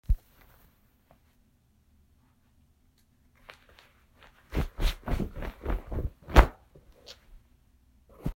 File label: Clothing Ruffle